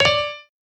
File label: piano